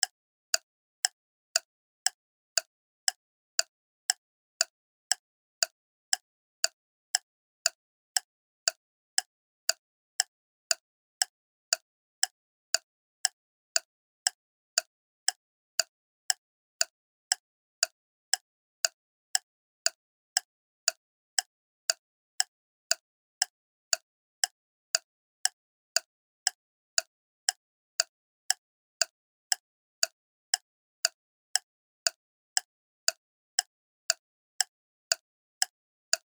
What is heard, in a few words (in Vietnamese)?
dong ho tich tac